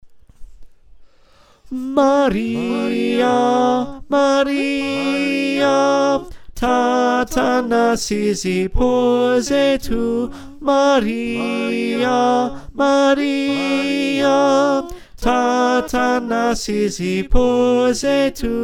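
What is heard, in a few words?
tenor, choir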